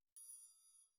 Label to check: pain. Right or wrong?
Wrong